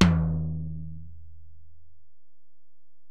Tom, Alesis DM-PRO, Drum Machines, Toms

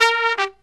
TRUMP, Killer Brass Riffs; USB Soundscan vol.29 - Killer Brass Riffs; AKAI

